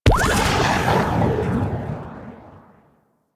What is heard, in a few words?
Armillary Sash Cast Burst; Warframe; Nezha